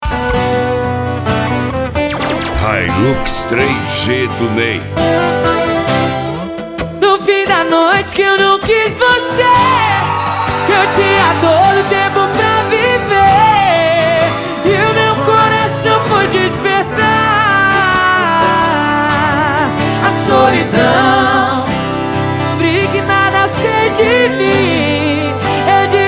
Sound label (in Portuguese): esp sertanejo